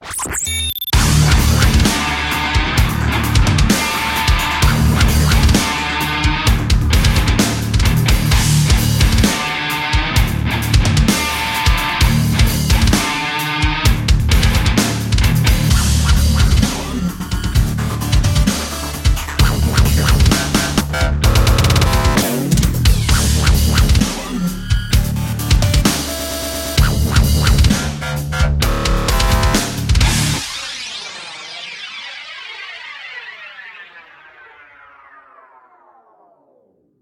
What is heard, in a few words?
Bed Rock